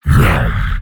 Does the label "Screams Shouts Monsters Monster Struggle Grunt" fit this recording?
Yes